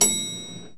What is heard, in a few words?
Elevbutn